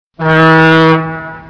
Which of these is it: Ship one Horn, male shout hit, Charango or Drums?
Ship one Horn